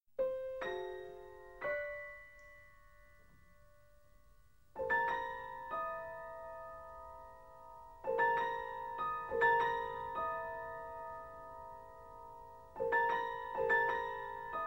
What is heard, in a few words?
for solo piano